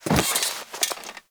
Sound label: Classroom Chair Sit; Ambience; Warframe; TheNewWar; Zariman